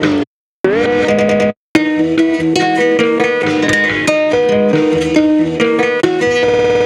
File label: guitar